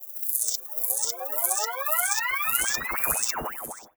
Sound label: locatormagnet mono; Dokobots